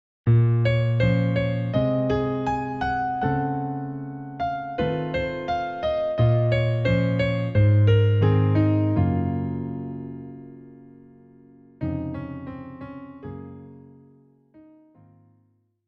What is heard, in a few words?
piano solo